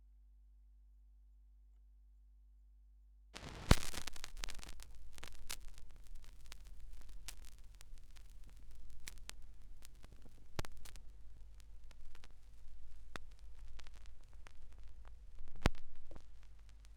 schallplattengeraeusche

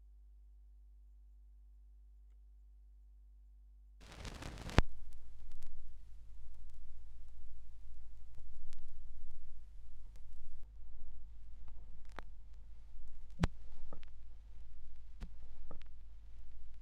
schallplattengeraeusche; rauschpartikel

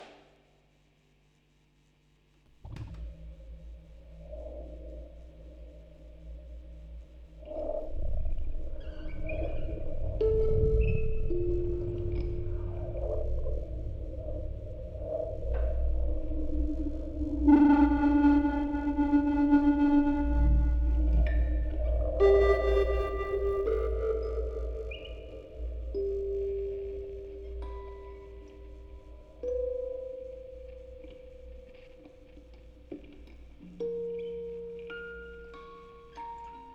Aufnahme Rode NT4 Tape out Vocoder, Spieluhren-Quartett; Tonband; Fieldrecording

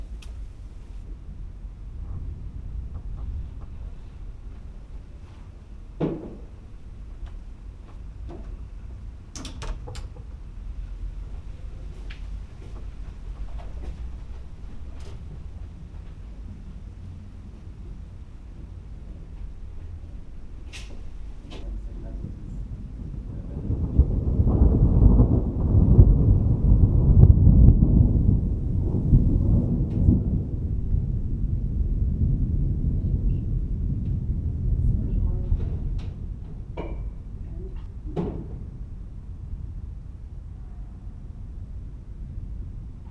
Gewitter; Fieldrecordings; ca 3 Uhr morgens

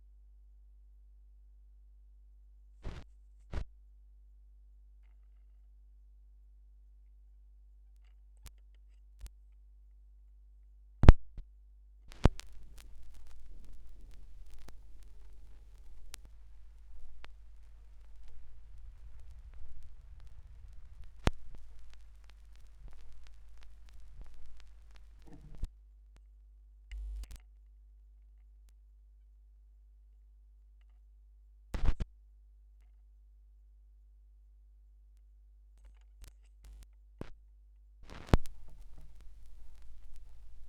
schallplattengeraeusche; rauschpartikel

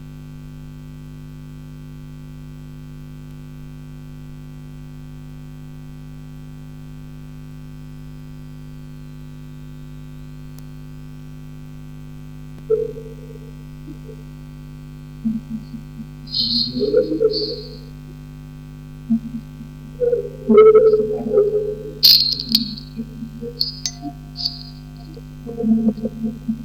Aufnahme Vocoder doppelmono, Spieluhren-Quartett mit Tonband; Tonband, Vocoder und Fieldrecording

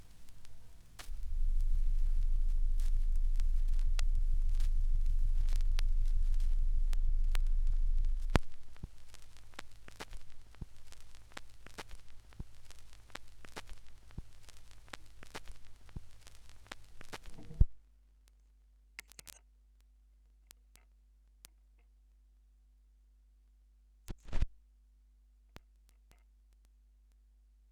Schallplattengeraeusche; rauschpartikel

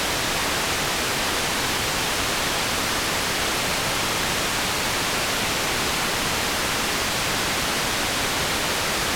Analoges Reciever Rauschen

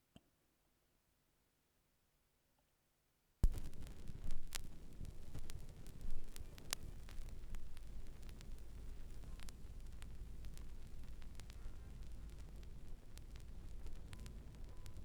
schallplattengeraeusche

